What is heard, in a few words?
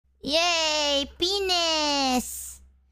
yayyyyyy